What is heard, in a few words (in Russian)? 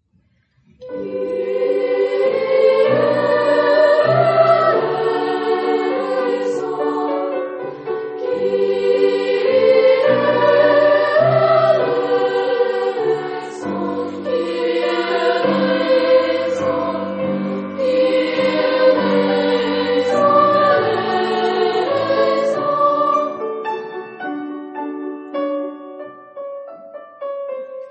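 Хор старших классов. Месса соль мажор; Ф. Шуберт; Kurie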